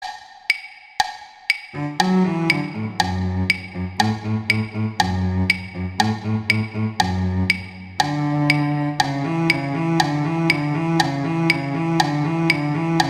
Cello I; Duets; Mozart

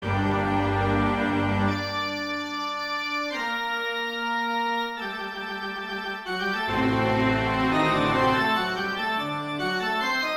Adagio e staccato, organ; Handel; Organ Concerto Op4 No2

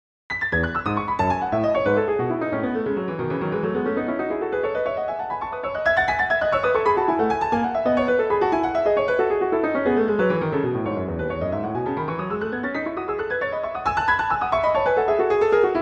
Exercise, E major; Clementi; Preludes

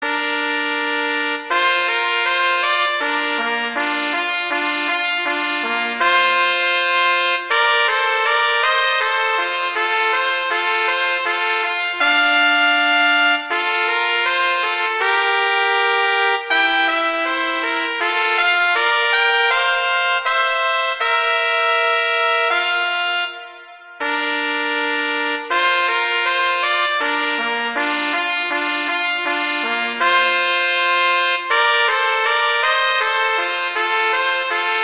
Duet; Mozart; Duets

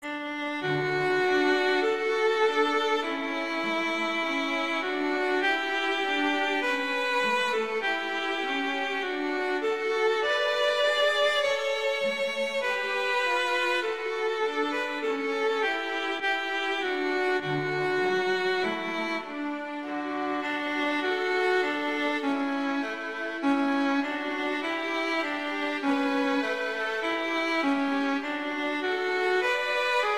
Duet, Romanza Andante; Mazas; Duets

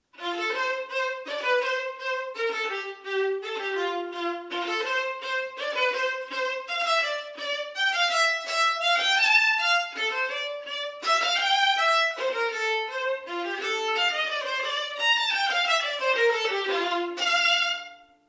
Melody, violin; Fitzpatrick; Violin Melodies

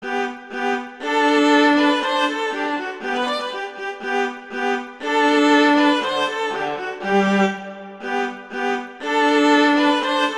Duet; Mozart; Duets; Vla

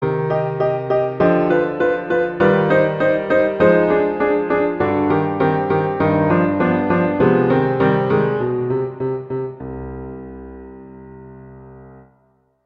Prelude, C minor; Clementi; Preludes

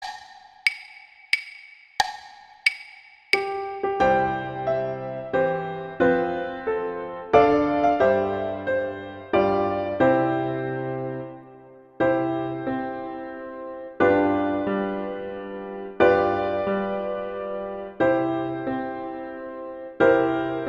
Happy Birthday acc

